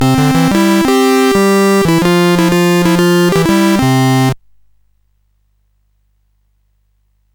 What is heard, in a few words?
sid CSG8580R5; Jumpman